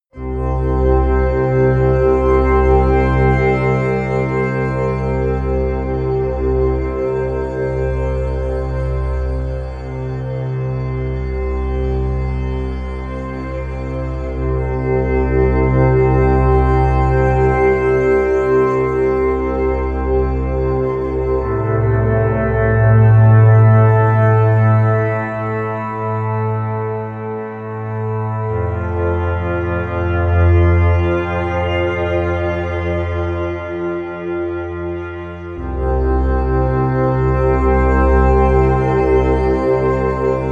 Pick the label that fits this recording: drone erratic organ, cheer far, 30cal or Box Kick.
drone erratic organ